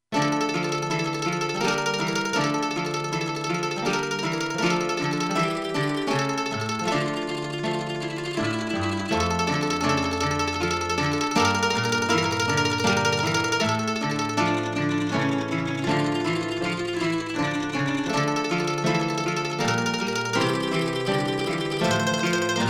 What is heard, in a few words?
Nylon Guitar Harp Tremolo Mandolin